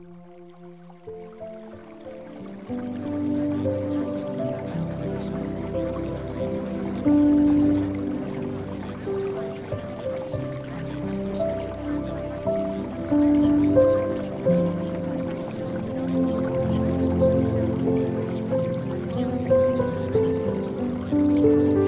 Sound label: Music; ACCELERATED LEARNING